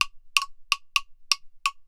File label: perc 127 woodblock; drums pads